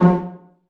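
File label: pizzicato C1 MF, STRING PIZZ; Sound On Sound - Peter Siedleczek's Orchestra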